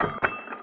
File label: Currency Midas Flyoff